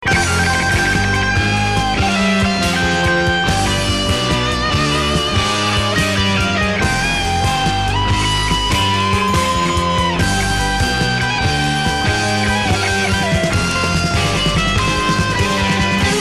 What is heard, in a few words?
guitar solo